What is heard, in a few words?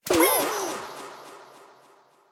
Mombo-Exclamation; Super Mombo Quest